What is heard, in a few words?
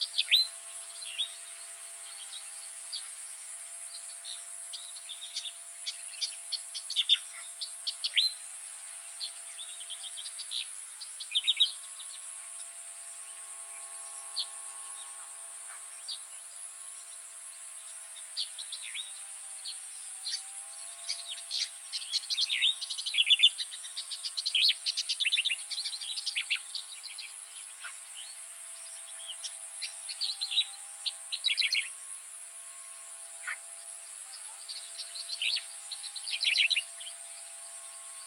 Striated grassbird